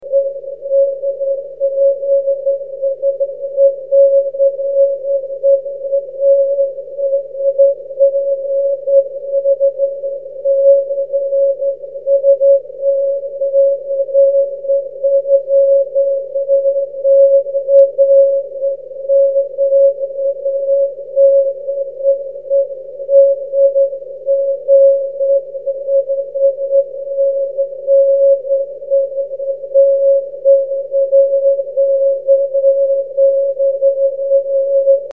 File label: cw, 23cm